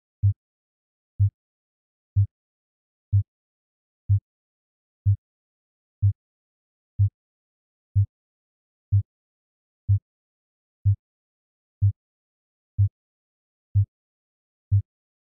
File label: Bass 100Hz